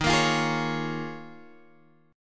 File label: Eb 7th Suspended 2nd Suspended 4th Eb, Guitar Open G